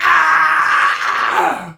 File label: Pain; Warframe; Tau; Dax; Ashigaru